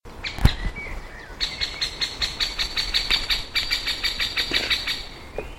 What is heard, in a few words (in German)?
Mittelspecht, Wald; Burgbernheimer Wald; Matthias Bull